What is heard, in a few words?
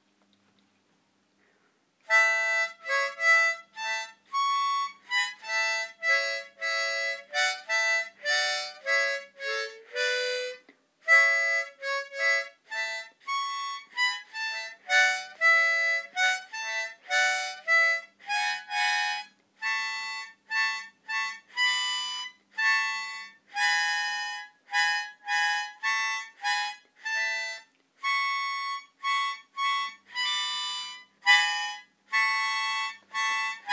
Harp